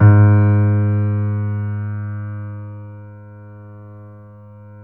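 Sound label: G#2 R, GRAND PIANO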